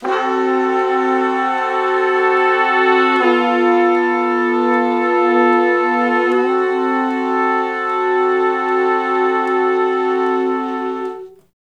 Slo Blues Chords [I, IV, I, I] (D), Horncraft for R&B; Sections; Crimson Blue and Fabulous Horncraft 4 RnB